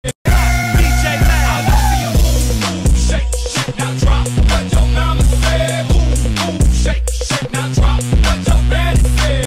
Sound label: boom boom shake